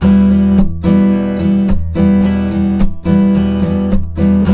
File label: RIFF, Blues Guitar; ILIO; Fretworks